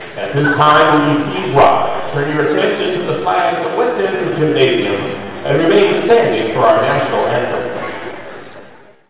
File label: National Anthem Intro